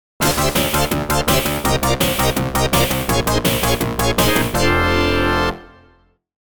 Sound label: Unused Jingle; U.S. Championship V'Ball; arcade